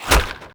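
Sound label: axe hit thrown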